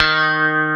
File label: FRET; E-MU Formula 4000 Series Vol. 3 – Analog Odyssey; Vintage Combo